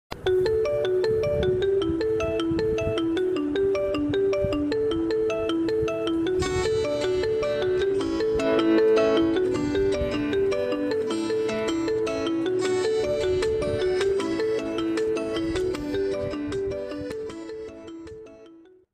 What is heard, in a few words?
Mi Classic Ringtone; ringtones